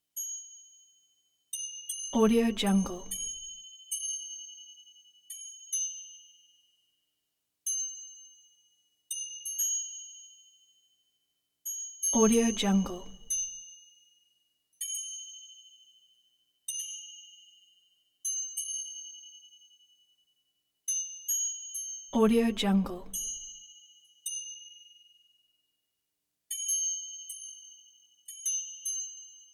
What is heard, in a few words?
wind chime tinkle glass small magical pleasant